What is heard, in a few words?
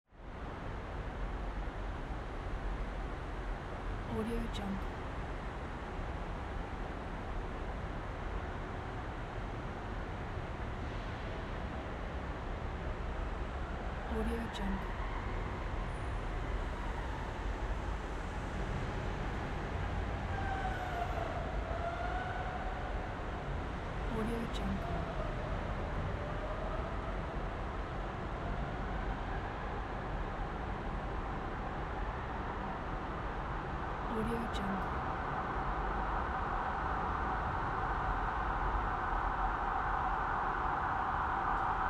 traffic jam in a road tunnel with emergency vehicl